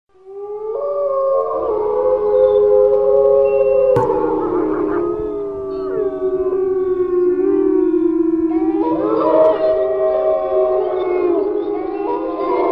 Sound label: Gray Wolf Howl